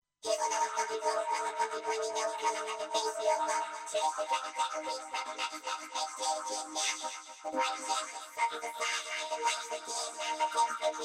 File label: voc whisper